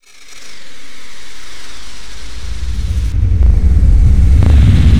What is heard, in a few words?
FX REVERSE